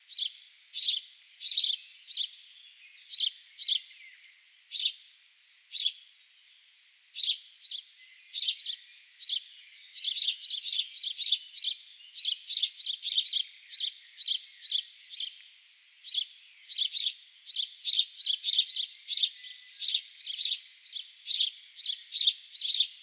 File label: Naminis Žvirblis - House Sparrow - Passer domesticus domesticus